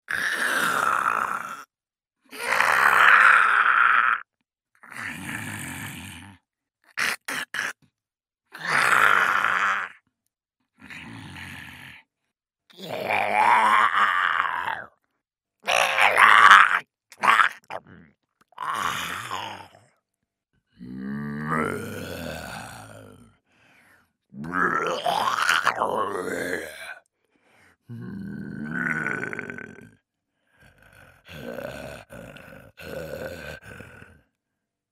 게임 샘플 괴물 호흡, 남자

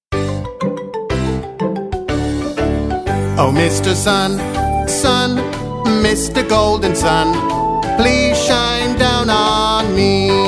영국남 동요

